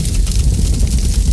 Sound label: Dark Fog Walk; Seer; GamigoNA